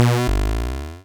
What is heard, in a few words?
Cry